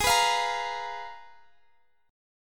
G sharp Minor 6th Add 9th, Guitar Half Step Down

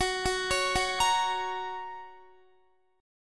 Gb Major Gb down Guitar; Listen to Gb arpeggiated

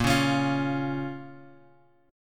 A sharp Minor A sharp, Guitar; A#m Chord {6 4 X 6 6 X} strummed

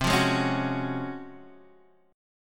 Db Major 11th C

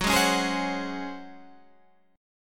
C sharp Major 7th Suspended 4th F sharp

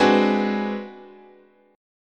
G sharp 7th Sharp 9th Flat 5th, chord, guitar

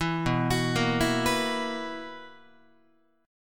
B Major 7th Suspended 4th B, Guitar; arpeggiated; 7,7,9,8,8,10

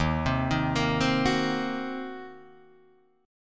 D sharp Minor 6th D sharp down Guitar Drop D

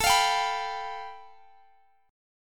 A 7th Suspended 2nd Sharp 5th A

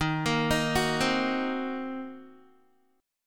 Eb Minor 6th, down, Guitar; arpeggiated; 4E,4A,5D,5G,5C,6F

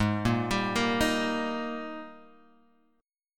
Ab Major 7th Suspended 2nd, down, Guitar, Open G

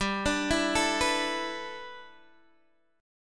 G 6th Add 9th G, Guitar DADGAD; down

